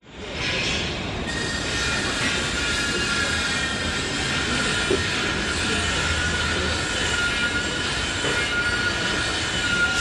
moscow russia construction summer building site medium distance hammers echo; metal clinks